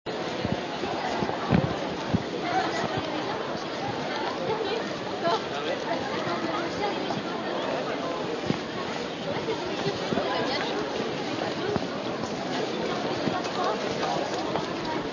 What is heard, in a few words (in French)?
ambiance foule dans la rue